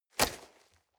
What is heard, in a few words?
nylon leather hit soft